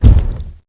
forest keeper step; lethalcompany; zerosiki; slender

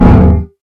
scare; itsteals; legs; slender

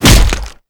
hit; chriswalker; slender

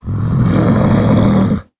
alert, male zombie; nmrih; zerosiki; slender

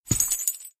footstep; chriswalker; slender

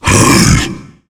tank climb, growl

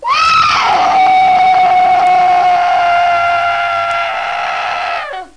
alert; cof; faster

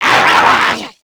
kid zombie alert; nmrih; childrenofthedead; zerosiki; slender

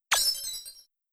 box use; tf2ware ultimate; mariokart

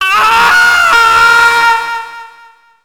death scream; slender

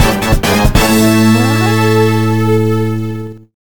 game clear; tf2ware ultimate; music game; ds touched

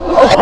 normal attack; floaters; slender

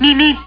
beep beep, kart horns

